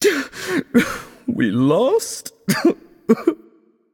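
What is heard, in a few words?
nonverbal boohoo; voicepack; smite; merlin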